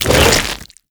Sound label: open fast, zombie blind jaws; Half Life Alyx; creatures